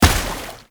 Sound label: Heavy Step Water, Footsteps; Warframe; Tau Dax